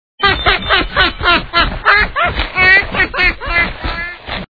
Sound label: duckling laugh